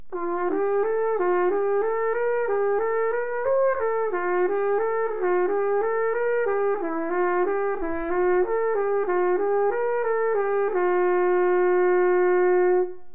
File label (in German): altetrompete; clarke